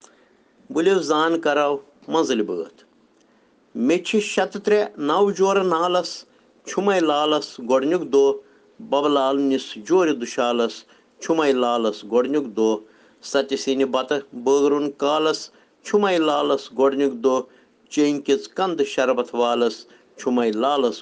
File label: Children Rhyms in Kashmiri